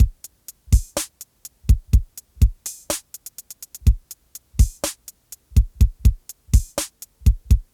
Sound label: Lofi